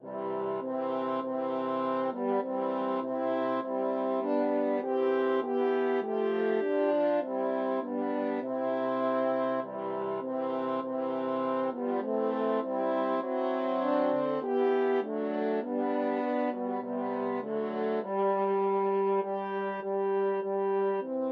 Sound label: french horn trio